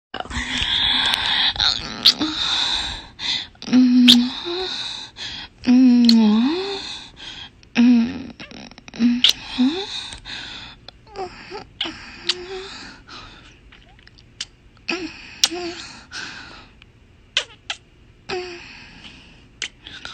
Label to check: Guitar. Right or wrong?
Wrong